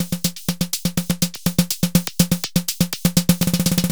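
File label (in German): snare wirbel; Music Store Sound Service; Einstein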